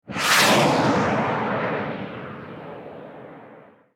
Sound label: Jet Pack Pass